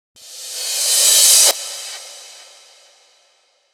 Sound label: ReCrash; FLPRO